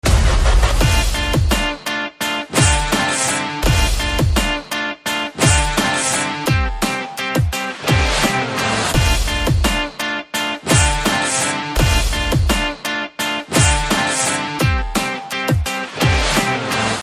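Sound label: Trailer background music Telugu Ringtone; World Famous Lover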